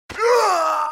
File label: Player Killed; Platformer1